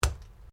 ORGANIC STAB WET; Various Organic Foley sounds; Stabs and Slices; Combat

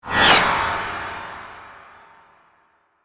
Hit Reverse, Suction, Scrape; Production Element, Imaging Element, Accent, Transition

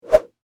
Whip Whoosh Coax, Cable; Foley; Sound Effects:Objects; BlastWave

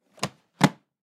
Suitcase Close; Foley; Latches; Objects; Blastwave

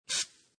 Spray Can Single Spray Burst; Spraying; Objects

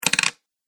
Gold Coin, money; Money Sound Effects

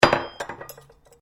cutlery general drop bench, Household cutlery; Kitchen

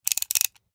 Ratchet Crank; Power Tools; INDUSTRY; Blastwave

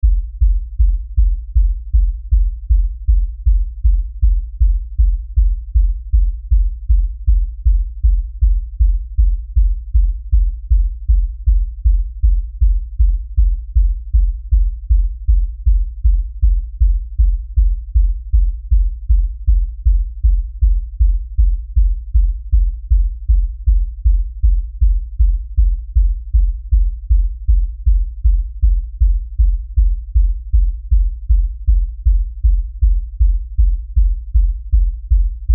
Lfe Beat Low Bass Pounding Rhythm; Miscellaneous Musical; Blastwave

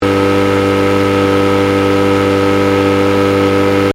Lawnmower loop, Lawnmower (Electric): Running; Lawn Mowers; Machines; Lawn Mower Sound Effects; Stuart Duffield

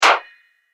Magic Ringing Impact Hit

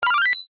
UI COMMIT, User interface feedback sounds; Computers; Electronics

